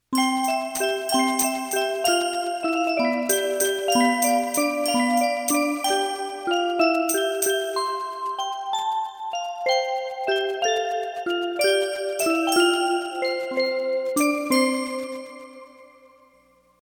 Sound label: Musicbox